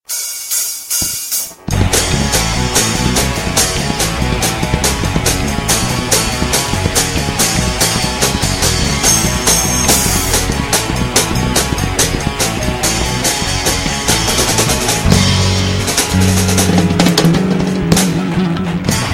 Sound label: riff